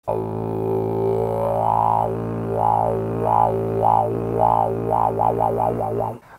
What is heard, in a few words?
Didgeridoo